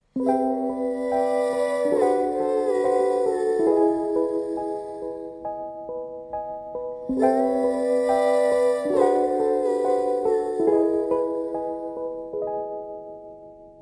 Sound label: Acapella